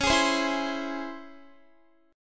Db Minor Major 7th Db Guitar; strummed; x,16,18,17,16,19

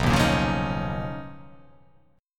B Major Sharp 11th

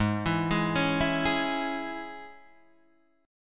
G sharp Suspended 4th Sharp 5th G sharp; G#sus4#5 Chord {4 7 6 6 5 4} arpeggiated

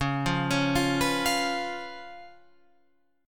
C sharp 7th C sharp, Guitar Open F; C#7; arpeggiated; tuning=Open F; guitar chord

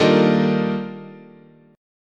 D sharp Major Sharp 11th, chord

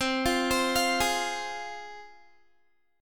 C Suspended 4th Sharp 5th, C, down, Guitar; Csus4#5 arpeggiated

